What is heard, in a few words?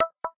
Letter, notifications; Wiko Hi Enjoy 60s